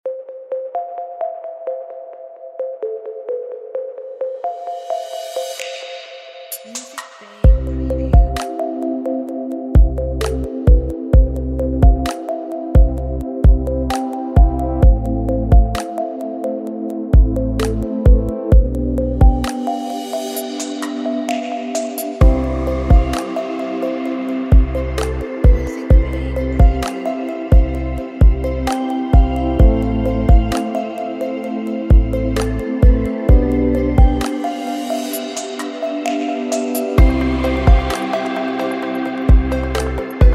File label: Ambient Electronic